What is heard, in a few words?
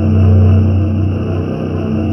Control Screen Scroll; moul